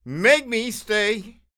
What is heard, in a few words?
SING, Blues Soul; Spectrasonics; Vocal Planet